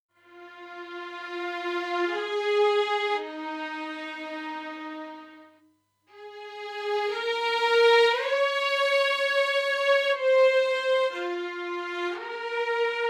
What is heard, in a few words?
V Solo portament vol; オーケストラサウンド; 『新・DTMオーケストラサウンドの作り方』